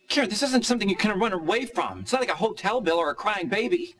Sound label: Crying Baby